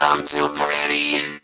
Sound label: die vo